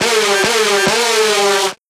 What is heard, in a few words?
Trap Siren